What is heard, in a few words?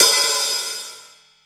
open, Drums Percussion; Drum Bass; Zero-G; Total Drum Bass